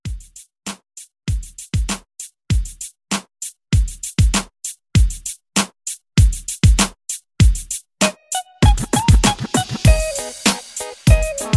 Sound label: Mixshow Re Drum 98